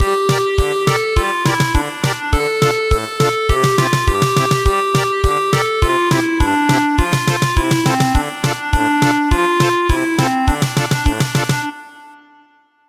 German Polka, traditional; Plouf tizen tizen

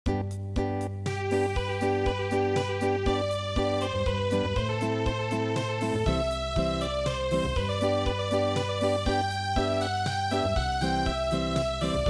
Unplugged, traditional; midi

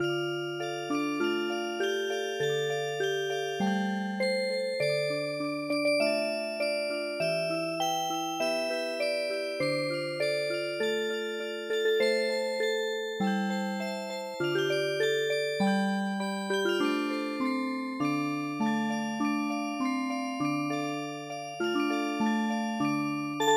Music Box

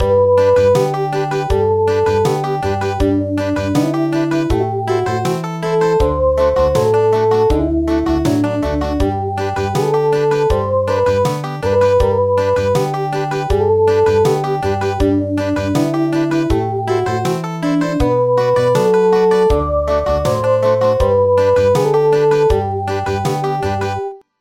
Country

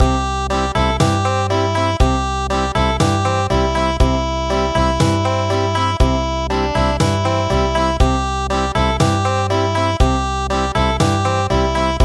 Country

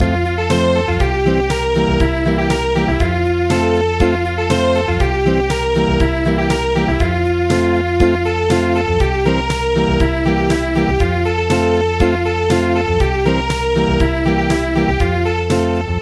Samba Acoustic; midi; traditional

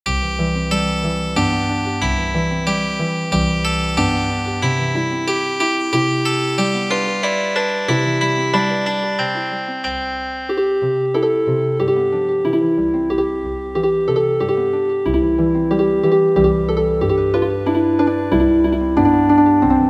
Healin Harp

